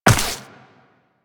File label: Step Ice, Footsteps Walk; DE; Warframe; Tenno